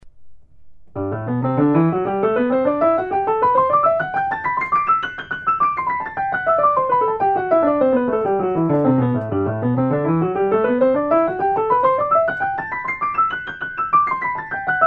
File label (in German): hanon G dur